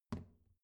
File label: plastic trash can close soft